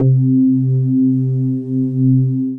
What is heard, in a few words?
Dance Floor Bass, Multisample Basses